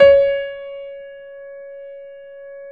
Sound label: C#4, GRAND P; USB Soundscan vol.17